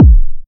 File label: kickdrum sound b key